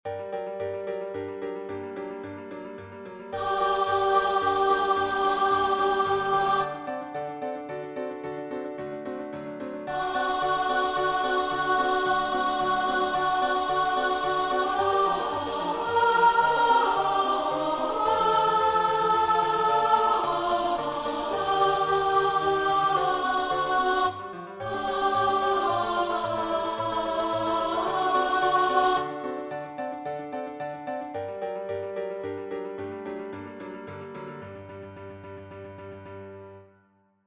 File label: Fecit potentiam alt